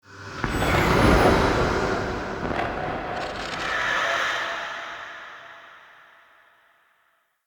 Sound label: Boss Killed Stinger Hard Mode; Hud UI